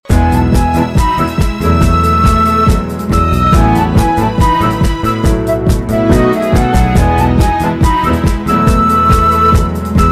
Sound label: music; scratch2